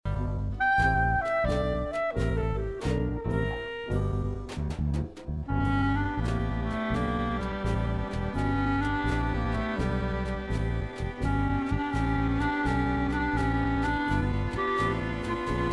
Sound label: Backwards Song Audition Track Music Only; Genre: Blues